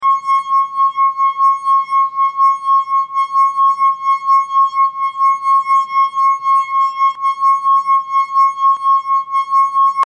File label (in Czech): sklenicka; slysime a poslouchame